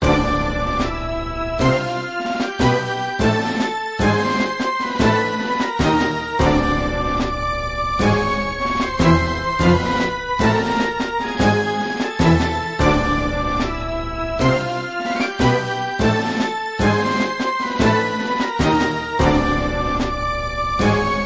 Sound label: Orchestra High Action; The Operative No One Lives Forever